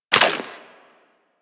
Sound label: Bullet Impact Debris Rock; Warframe; Weapons